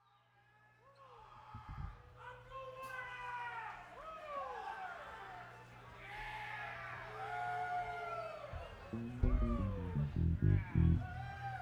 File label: Crowd